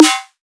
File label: snare dunk; drums; base pack